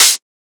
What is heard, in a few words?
Closed Hat; FLPRO